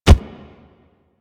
Truffle Jugger Step Default; Warframe; Infested; Juggernaut; Truffle Hunt

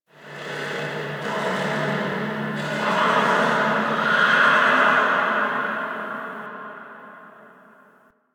Ghost Horse; Dagath; Warframe; Ambience; Clan Dojo; Dojo Misc

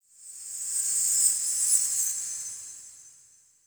Bell Jingle High D, Ambience; Champion Crypt

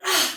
Pain Soldier Female, Battle Barks; Dialog; Sinecure

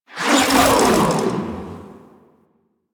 Grapple Travel B; Warframe; The New War; Archon; Nira; Abilities

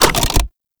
Hack Minigame Mech Action C

